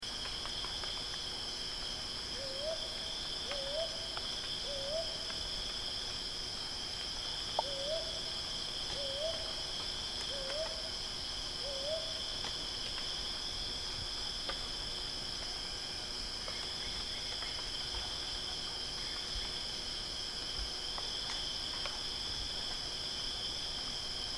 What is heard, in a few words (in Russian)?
iglonogaya sova golos